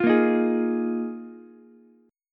D sharp Suspended 2nd Suspended 4th, Ukulele; strummed; chord; JGuitar

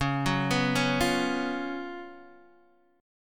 Gb Major 7th Suspended 4th Db, guitar

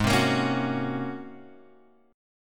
G sharp 11th, chord; G#11 Chord {4 3 X 3 2 2} strummed

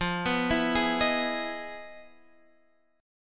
E Major 7th F, chord; arpeggiated; Emaj7/F; JGuitar

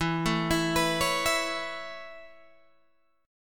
A Major Add 9th E Guitar Standard, chord; Aadd9/E arpeggiated; JGuitar

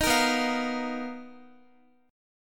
B Major Sharp 11th, Guitar, 4F,4A,5C,5G,5C,6E; strummed

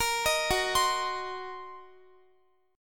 Gb 6th Flat 5th Gb down Guitar; Gb6b5 arpeggiated; 4E,4A,5D,5G,5C,6F

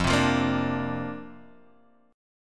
D-Minor 7th Sharp 5th F, chord

